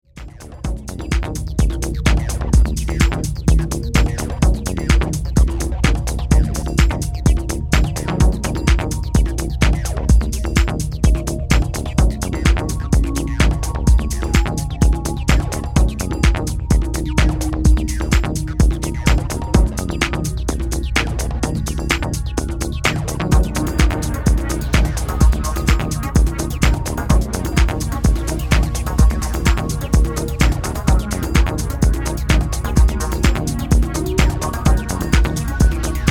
extended instrumental

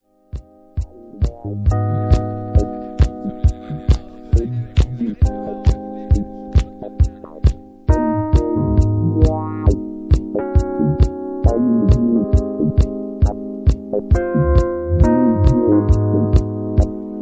Instrumental

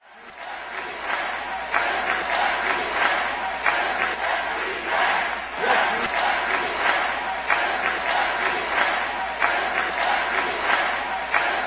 acapella

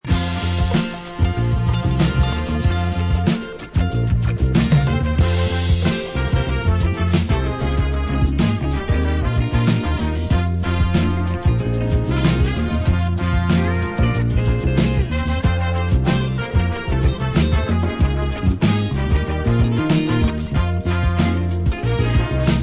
Funky Fanfare

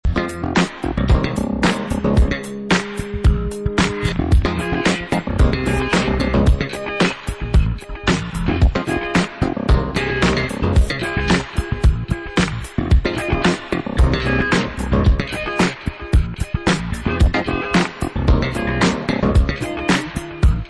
Instrumental; disco

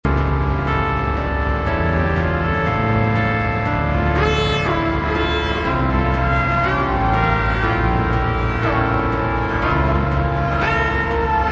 drum light

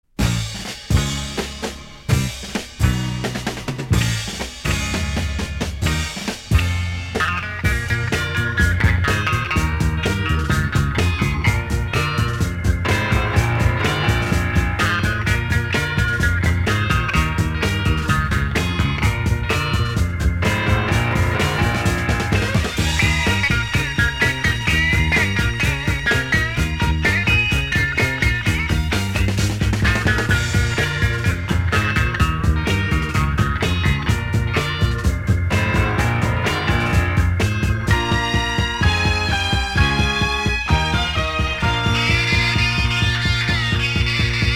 bass string

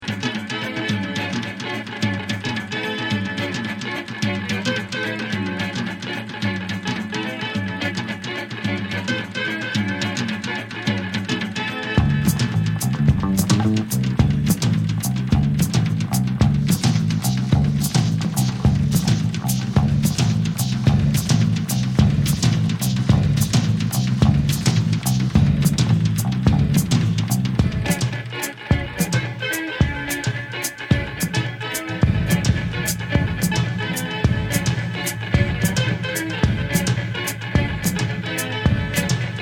beat

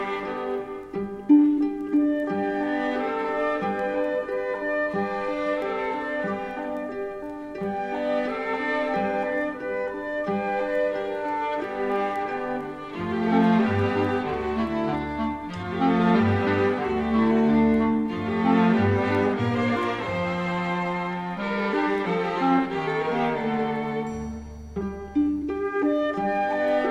Lullaby instrumental version

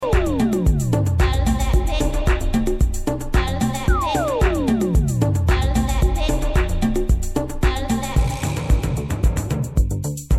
phaser dub

